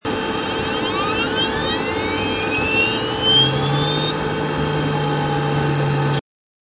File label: laser scanner normal